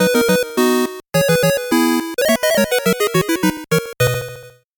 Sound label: 8bit jingle fast